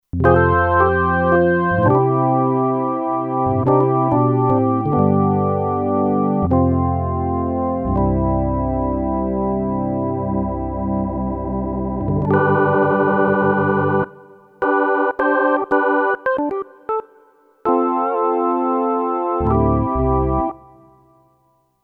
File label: Ballad Organ, Kurzweil K2500X; Factory Programs